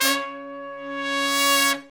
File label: BRS, Brass Sections, R&R Horns; Roland LCDP06 Brass Sections